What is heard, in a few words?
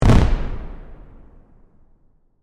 Fireworks Burst, Short; Blast Wave